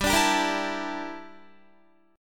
G Minor 6th Add 9th G down Guitar; Gm6add9; strummed

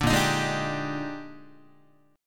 A sharp 13th, Guitar chord; strummed; JGuitar

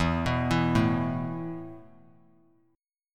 E Suspended 4th E, down, 3C,3F,3A,3D; Esus4 arpeggiated

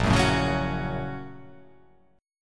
Bb Minor Major 7th Sharp 5th; BbmM7#5; strummed; x,1,4,2,1,1,3,3; JGuitar

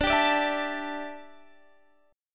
D Suspended 2nd Suspended 4th, Guitar, Standard; strummed

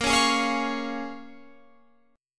Bb Minor 7th, Guitar, Open F; Bbm7 strummed

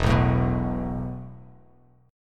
E Suspended 4th E, down, Bass Standard; Esus4 strummed

